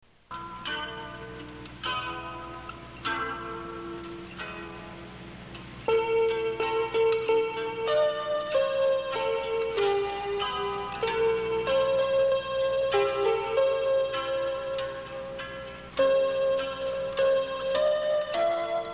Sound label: Guitar and elepi; 中国語劇主題曲集